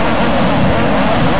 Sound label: Rocket, thrusters; 16bit